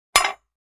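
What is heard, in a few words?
Large Tile Square Drop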